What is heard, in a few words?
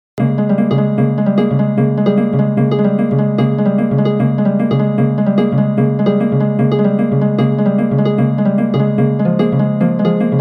cellular automaton harp